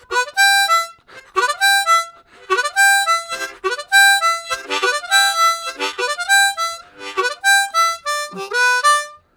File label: Lively, Harmonica, WAV Riffs; Zero G